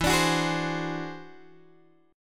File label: E Augmented 9th E down Guitar Open G